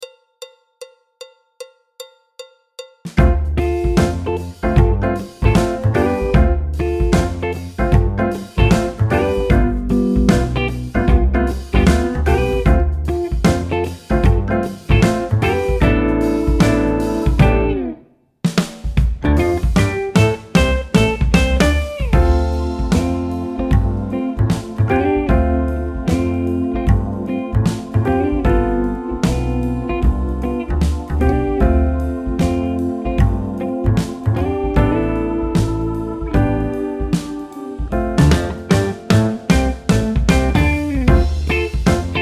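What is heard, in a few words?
backing track, Jazz; alto, trombone, piano; Jazz Syllabus Level 1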